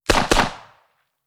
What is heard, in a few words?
Ingram M10 9mm 1m right clean Double shots